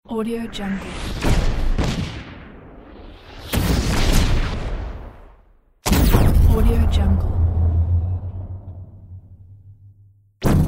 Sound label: multi explosion sfx pack